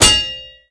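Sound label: armor heavy; The Elder Scrolls III - Morrowind; Morrowind GOTY GOG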